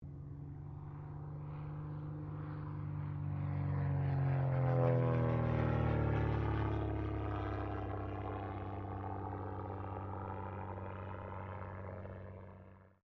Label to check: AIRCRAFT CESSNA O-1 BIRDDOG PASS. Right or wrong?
Right